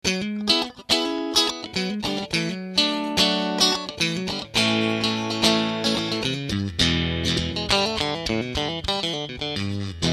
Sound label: Boost Drive Full